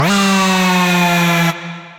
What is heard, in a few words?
Bellyfeel, Effects